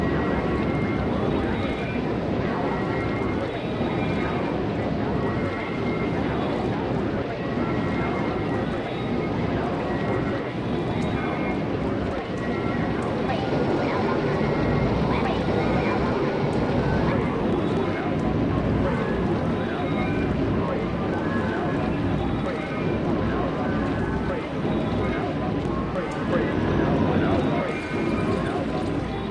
busy cyberworld

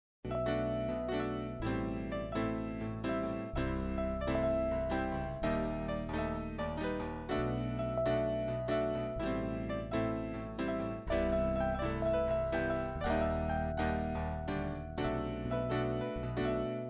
thumper chord

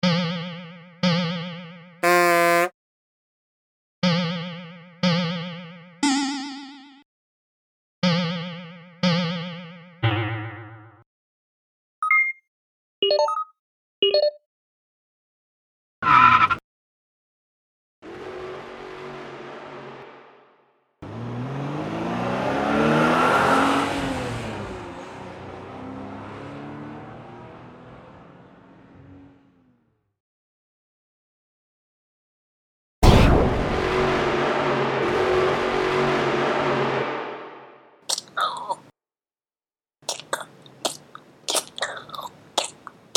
sfx kart game

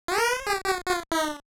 warlock laugh; 8-bit Platformer SFX

